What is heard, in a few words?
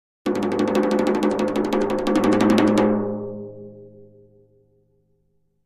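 Drums Toms B Roll Crescendo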